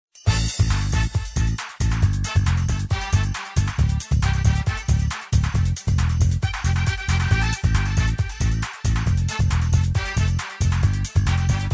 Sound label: pop dance